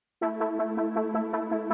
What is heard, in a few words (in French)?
Version Instrumentale Sans choeurs